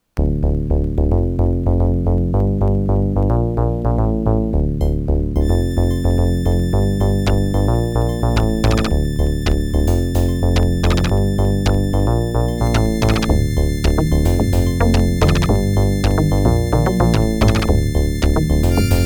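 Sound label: Intro Adlib CX4235 Speaker Out; Configured for Ad Lib.; Crystal 3D Sound (CX4235)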